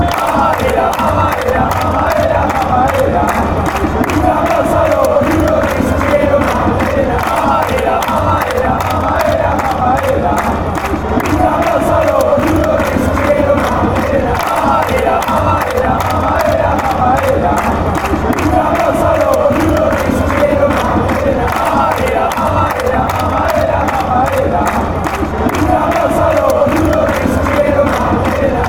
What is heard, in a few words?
baby bottle baby bottle, chants